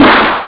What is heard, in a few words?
explode; SuperBallz